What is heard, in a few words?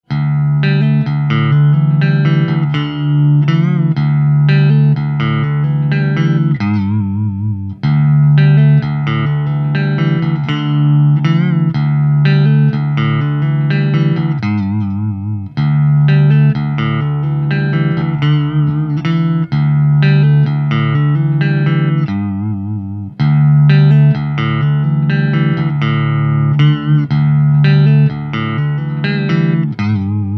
clean; Schecter EMG